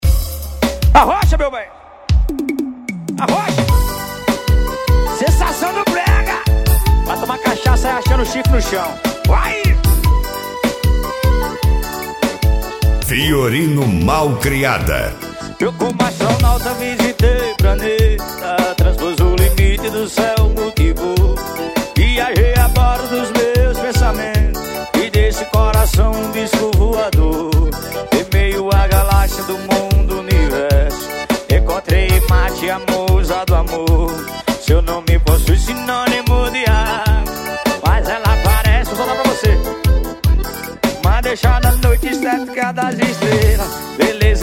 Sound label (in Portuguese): piseiro forro